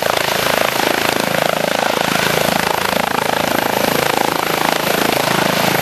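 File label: rotor out, helicopter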